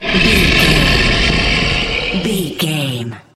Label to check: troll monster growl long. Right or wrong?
Right